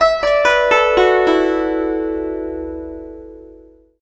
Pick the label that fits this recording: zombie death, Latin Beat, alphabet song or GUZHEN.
GUZHEN